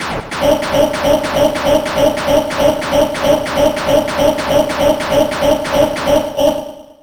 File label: diamond shot